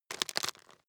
bone break midsize

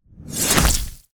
blood knife splash

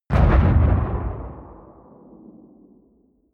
bomb rumble distant

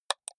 computer mouse left click single hard

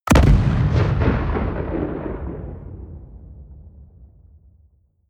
bomb fragmentation distant

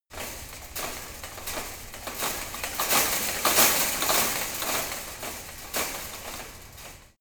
metal fence rattle light

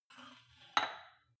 weight rack insert weight soft

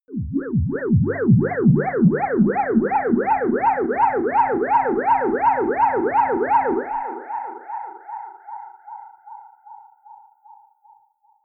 ululation ufo

